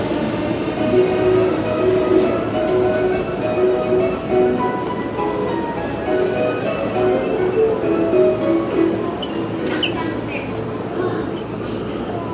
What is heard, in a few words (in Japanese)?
中央線三鷹西, train sounds